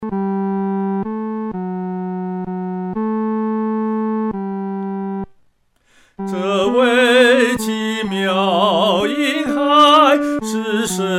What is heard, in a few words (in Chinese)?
独唱 第三声